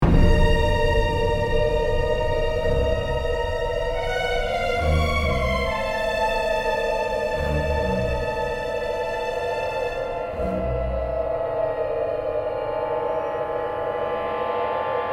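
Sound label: Hive Ambience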